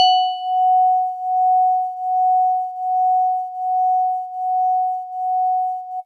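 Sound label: Jazzy Organ DX100 F#5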